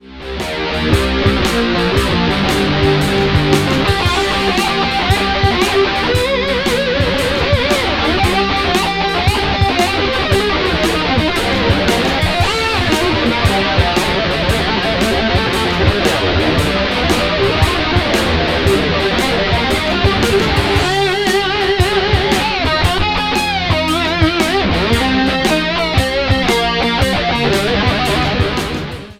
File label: Blues for Stevie 1st Solo